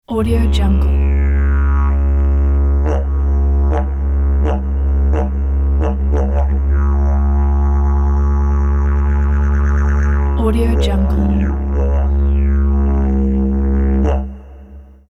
didgeridoo drone

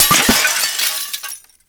glass window debris medium; rupture; soundmorph

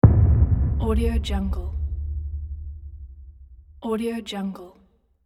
deep fx

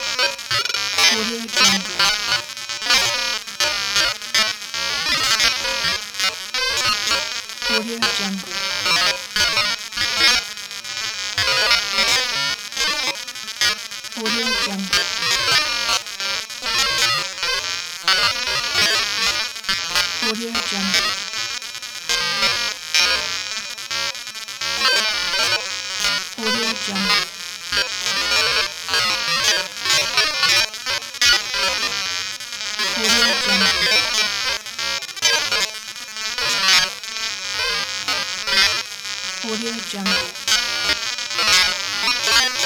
old electric machine loop

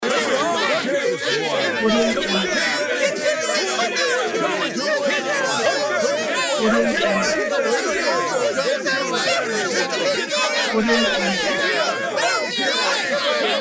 angry crowd loop

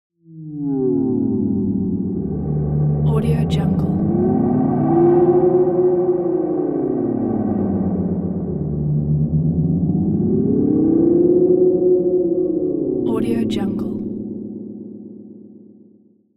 cinematic elements swaying drone

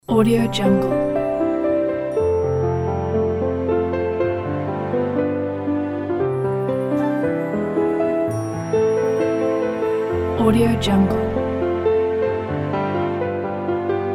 cinematic romatic

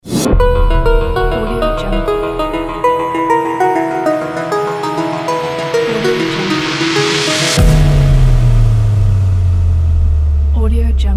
trend science logo sting

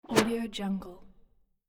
dirty whoosh

